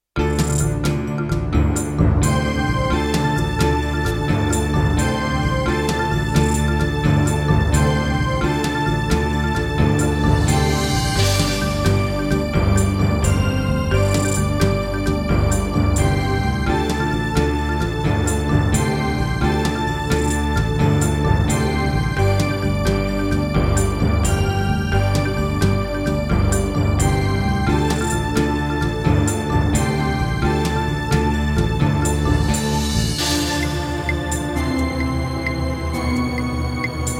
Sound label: Yamaha CBX-T3, wavetable; Heretic